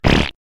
bronx cheer

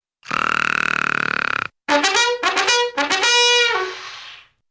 bugler snoring

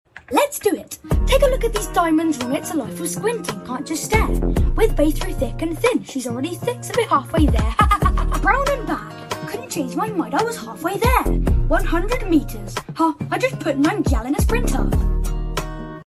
giggle; sprinter

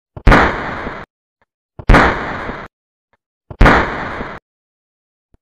minigun single shot

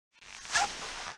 suit up; fnaf

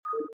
confirm low vol; starcraft

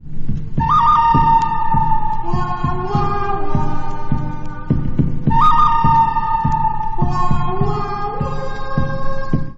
wa wa waaaa

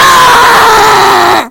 yelling

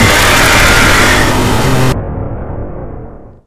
jumpscare sound; five nights at bonnies 3 remake

